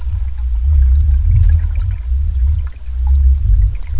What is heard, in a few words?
fish sound 75hz, tivoli bay sounds